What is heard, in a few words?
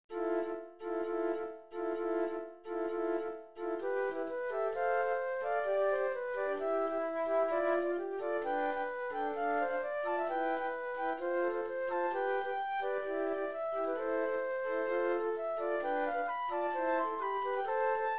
Flutes